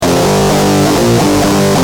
Guitar, Industrial

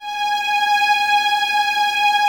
String Ensembles, Violas; G#5; Miroslav Vitous; LINKS ORCH

